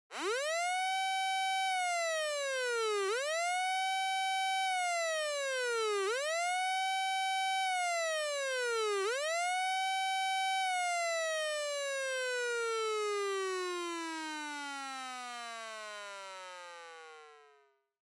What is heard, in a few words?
Police Car Siren Dry